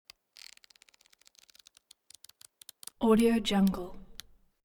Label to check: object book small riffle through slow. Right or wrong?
Right